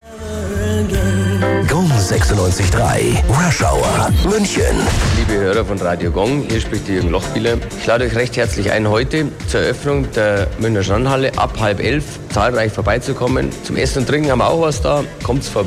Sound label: GONG; ideenladen